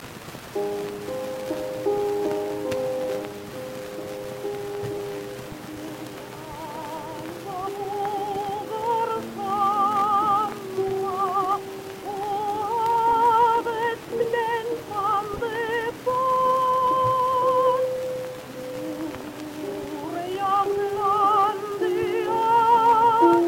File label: Gramophone